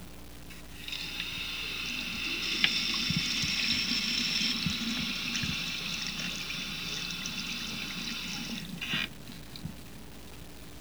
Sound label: FAUCET